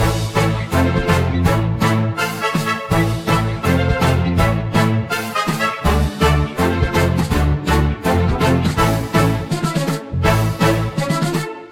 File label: music menu; Henry Danger - Day Of Danger!